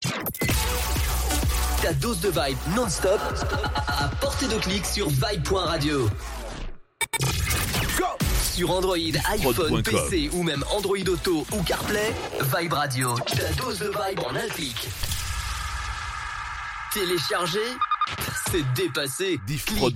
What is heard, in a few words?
Radio Jingles; Vibe Radio